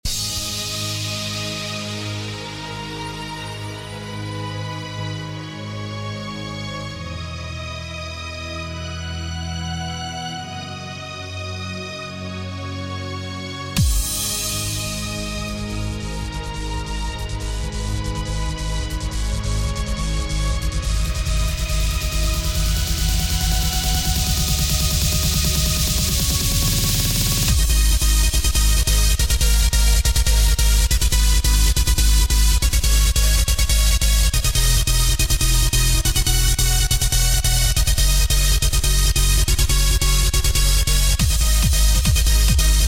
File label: Fighting Wild; DaveyBriteRPG